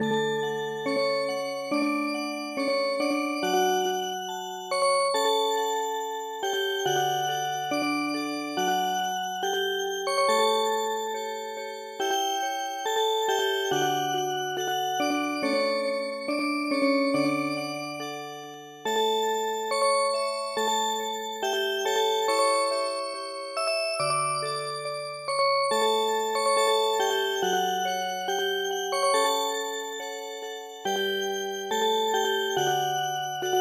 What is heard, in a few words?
Music Box; 八重山古謡の「雨乞ひの歌」に癒し系のアレンジを施したオルゴール曲を聞くことができます。; 「雨乞ひの歌」は、スローテンポな4拍子の日本の民謡です。; 歌詞は、「願ふダ以て」という出だしです。; ＰＣ ＭＵＳＩＣＢＯＸ; 癒し音楽（ヒーリングミュージック）